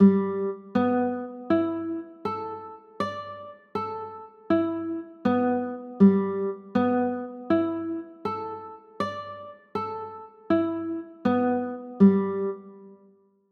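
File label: G 6 9 chord, guitar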